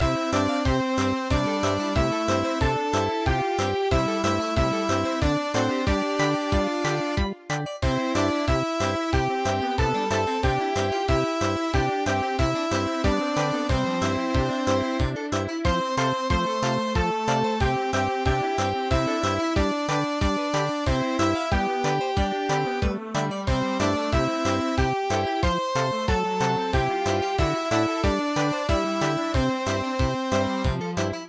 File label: parade, midi; koinobori